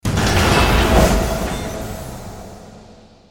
Stinger Action Short

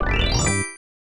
Money Collect; The Mask; SNES

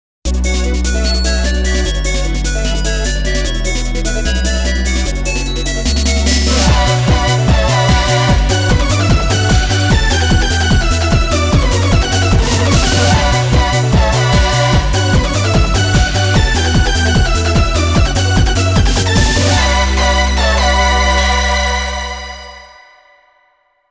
Win; Initial D Arcade Stage; sega

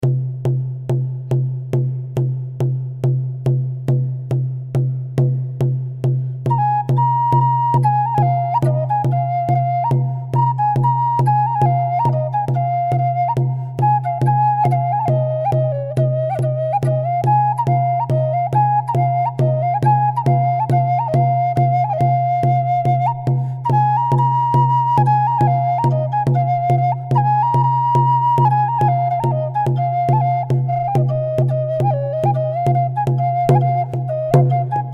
North American Flute Percussion

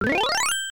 Credit SFX; hook arcade

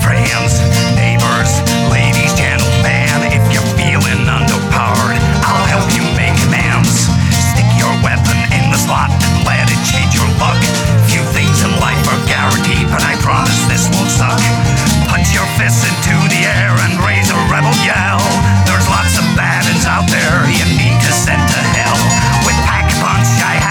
Pack-a-Punch Jingle; Der Riese; Call of Duty Zombies; Treyarch 2008

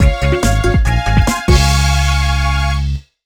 BGM New Level Unlocked; Foto Frenzy Spot the Difference 2009

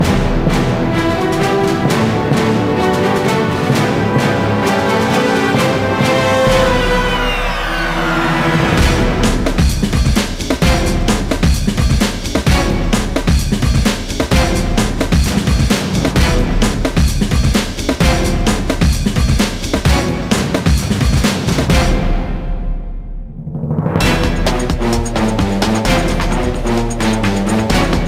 Zone Music; Wipeout; NDS

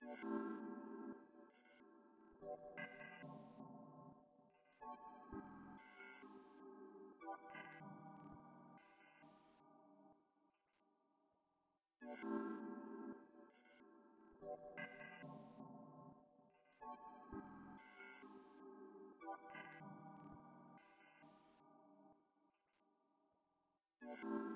BGM Mission Stage Boss; Alterna CleanRoom

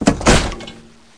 Catapult Release; Learn to Fly 3

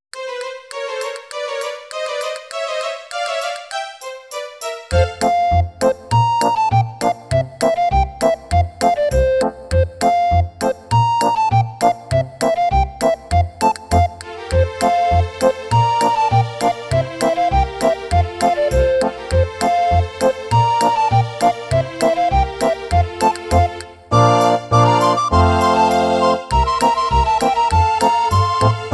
Dance 2 (Polka); Harvest Moon Back to Nature

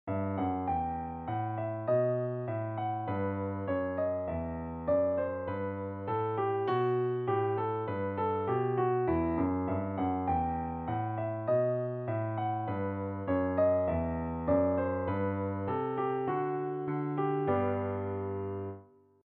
Bourree, piano; Piano app by Yokee

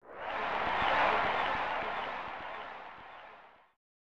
Applause; Flintstones The Treasure of Sierra Madrock; SNES

